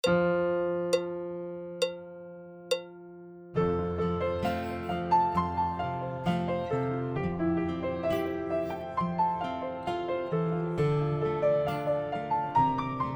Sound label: Backing; Section E